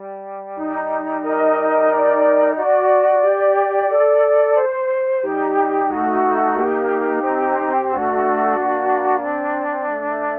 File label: flute, Sad